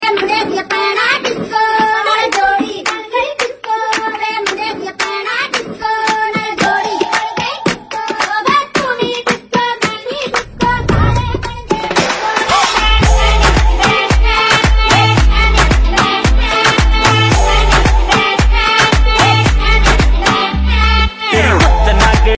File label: Disco Ringtone